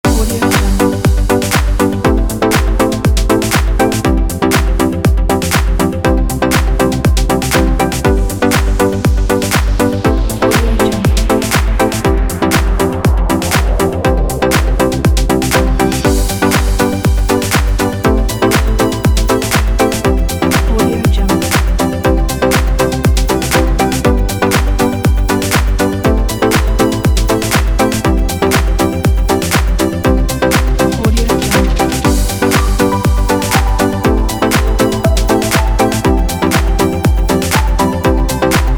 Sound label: tropical trance; tropical trance kit